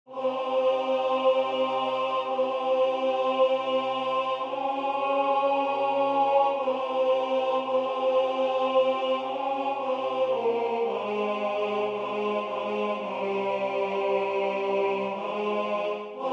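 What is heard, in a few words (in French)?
tenor, Musique sacrée; Adoramus te Christe; Gasparini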